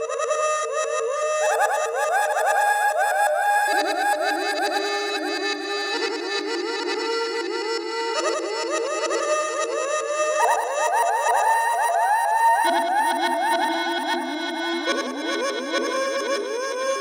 Digital Ring, ringtones; Allview Soul X6 Xtreme

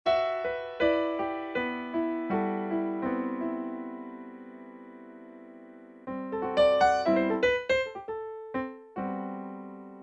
Piano, alarms; Bluboo; S8

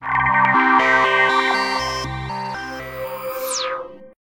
shutdown, ui; Samsung SGH-T839-Sidekick-4G

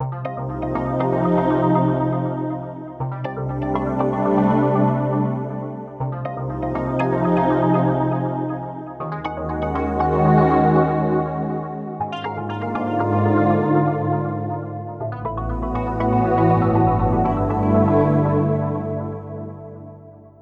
Tranquil, ringtones; Xiaomi; Black Shark

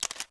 Shutter; LG; SV850-Wine-4

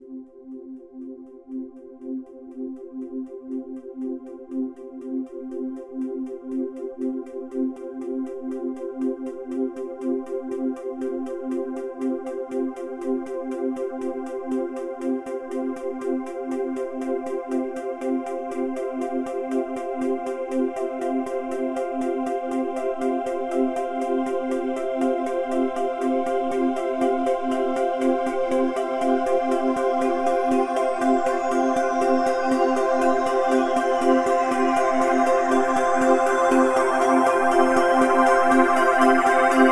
alarm sunrise; BlackBerry; OS-10

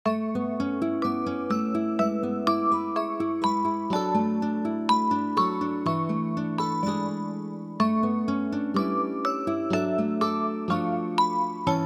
Pleasant Ode to Joy, alarms; LG

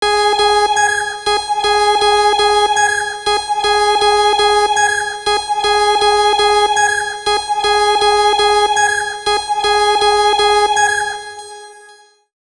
Overtoned; Sony Ericsson; Z780i; Bella

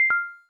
cam stop; LG; Optimus-L90-D415

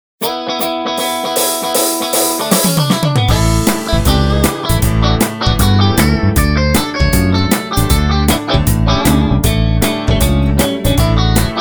blues; Itel

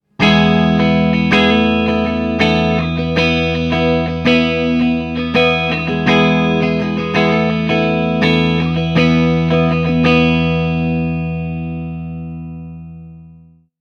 Soft Rock, ringtones; Marshall; London KB 1501

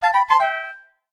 Windy

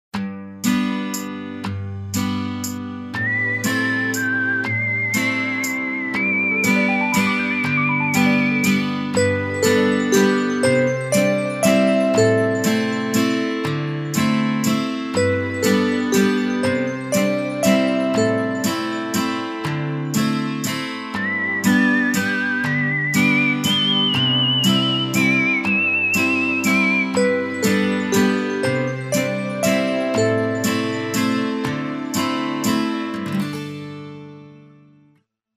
ringtones; Coolpad 5010

